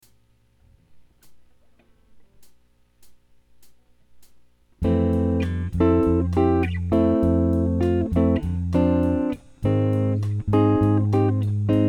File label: jazz guitar